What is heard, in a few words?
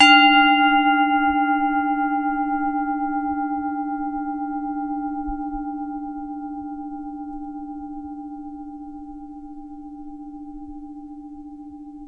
big dissonant round bell; Bells